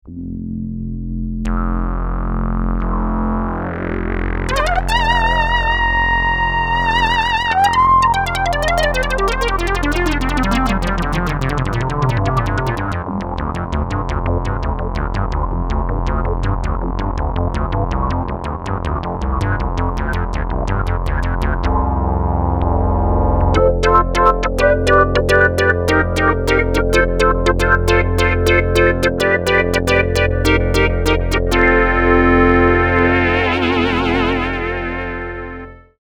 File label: Ski Canyon Delay, Prophet '08